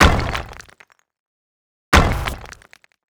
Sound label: Resynth Stone Break